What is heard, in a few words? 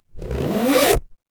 paper fabric zipper